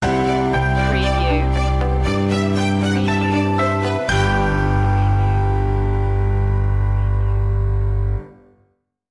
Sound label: flying high stinger